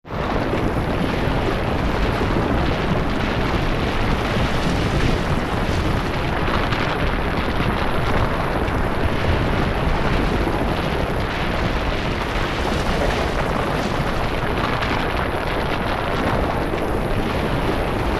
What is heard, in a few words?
Avalanche Snow Slide Down Mountain